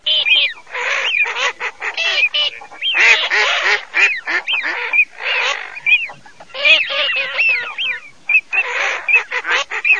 germa alza fischio, birdsound

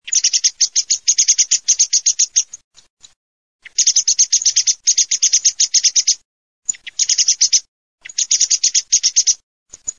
SCHIAMAZZO

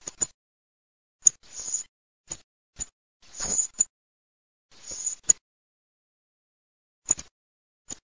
TORDO

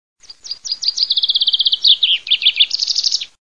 FRINGUELLO

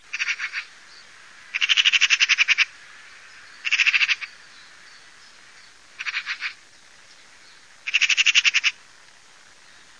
Gazza

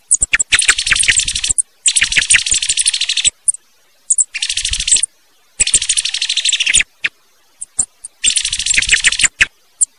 schiamazzo zip chio

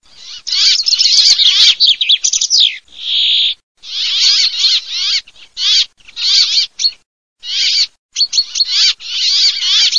fringuello peppola

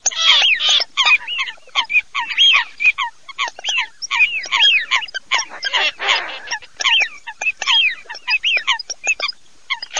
ALZA FISCH FOLAGA

